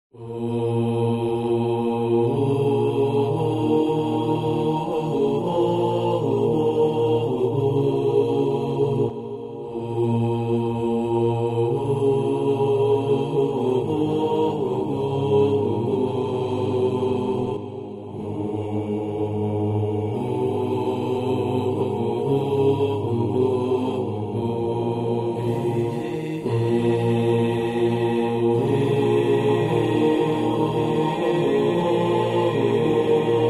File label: Vocal Ehsasi